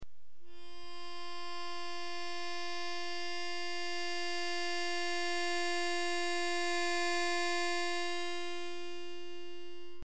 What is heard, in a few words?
F Natural